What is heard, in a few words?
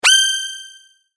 sword